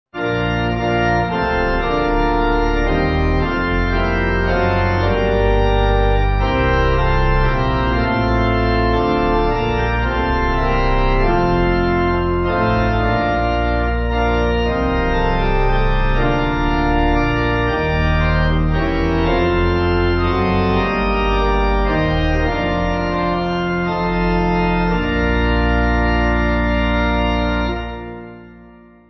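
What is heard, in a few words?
Pipe, Pipe Organ backing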